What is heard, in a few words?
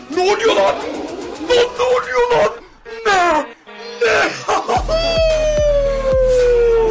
midi